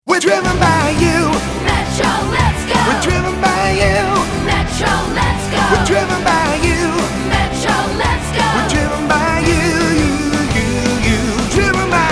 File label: vocal; Metro Holden